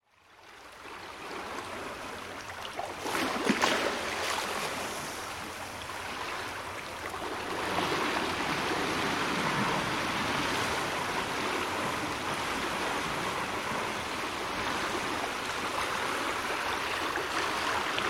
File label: sea dolphins